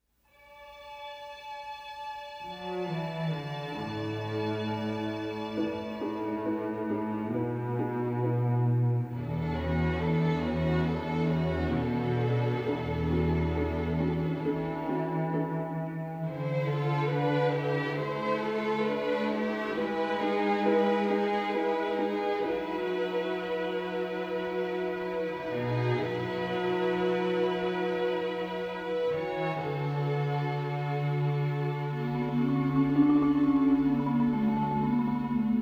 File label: Instrumental; Adventures Dinosaur City